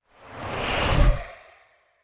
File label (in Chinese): 不祥之兆效果声